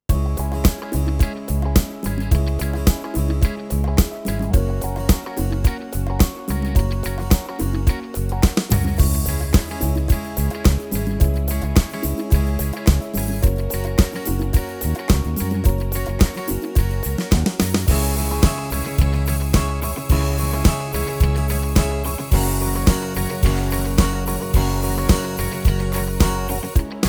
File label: style movie and show; yamaha tyros3